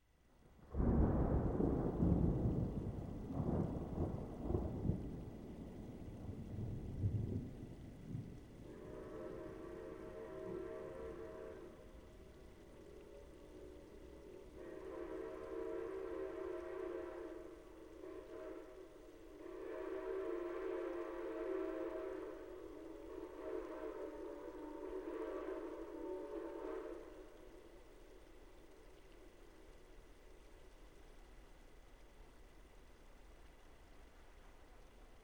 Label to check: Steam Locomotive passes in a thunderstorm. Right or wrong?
Right